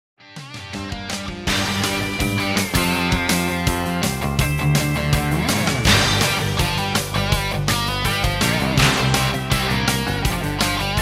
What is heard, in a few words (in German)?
musik hoerprobe